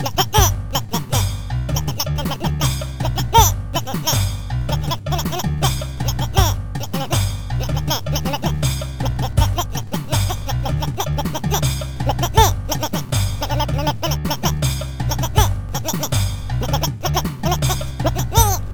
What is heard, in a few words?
rap; tux